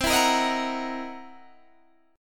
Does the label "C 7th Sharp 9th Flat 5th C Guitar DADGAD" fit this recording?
Yes